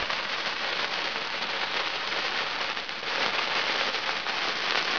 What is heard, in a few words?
fuse